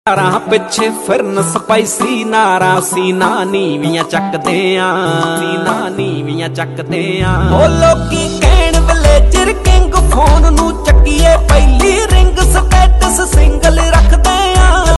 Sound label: Ringtone